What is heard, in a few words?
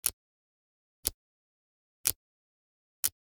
Generic Click Plastic Tiny; boom library; The Interface